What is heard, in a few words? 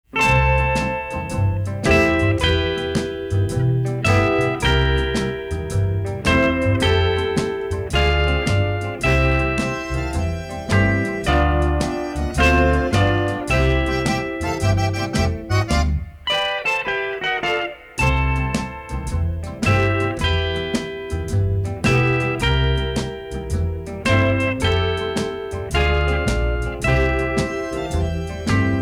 chimes, orchestra